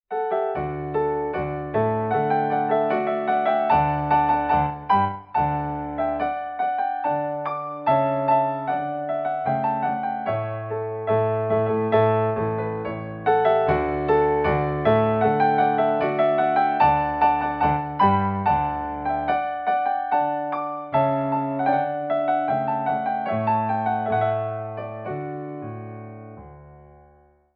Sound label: Rondeau, piano